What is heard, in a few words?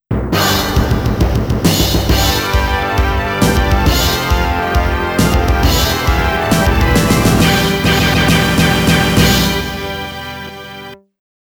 All Clear; SNK Arcade Sound Digital Collection Vol. 08